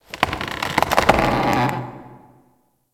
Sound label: Tree Creak; Ambience; Warframe; Tenno Hub